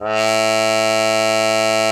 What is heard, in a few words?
cup mute A2; Xsample VOL-3